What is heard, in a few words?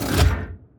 gun turret deactivate; fight